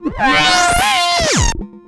Roland C Noise, Roland S100; musicradar-synth-samples

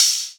Open Hihat; Vengeance Samples; VEE Cymbals

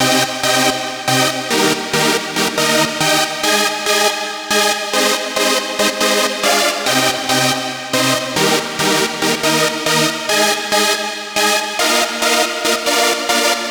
Lead, 140 BPM; Pitched; Vengeance Samples; Vocal-Hook Kits; Song Kit; Break The Walls

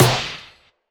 Snare, trap

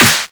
Claps; Vengeance essential club sounds-3

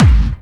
BD Punchy; Vengeance Samples; Bassdrums

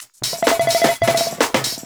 VFX2 Loop 128 BPM

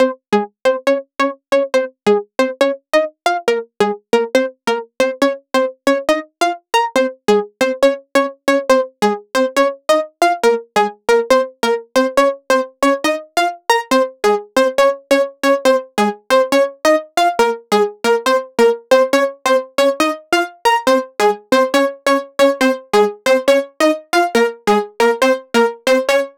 Mainmelody DRY 138BPM, A#minor; Unlooped; Vengeance Samples; Fusion Kit

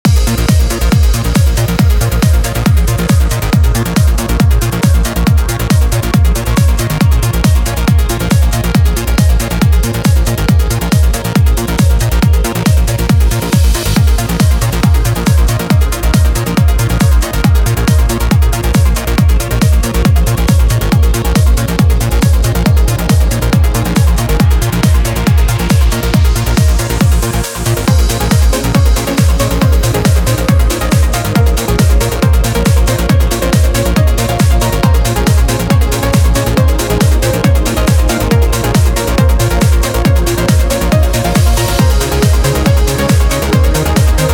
Complete Mix, 138BPM, A# minor; Vengeance Samples; Fusion Kit

